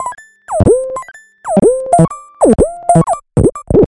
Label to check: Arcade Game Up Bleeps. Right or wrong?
Wrong